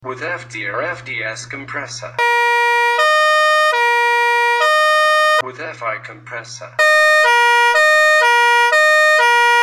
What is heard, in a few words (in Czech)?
fanfara fiamm ta 2 906 c spoiler